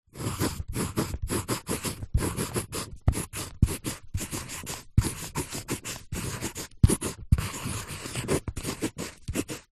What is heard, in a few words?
office pencil artists thick writing on paper